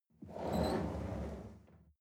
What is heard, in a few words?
chair wheel roll on floor soft squeak